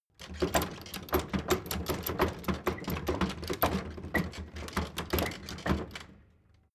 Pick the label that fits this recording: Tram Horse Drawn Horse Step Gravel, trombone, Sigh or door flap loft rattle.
door flap loft rattle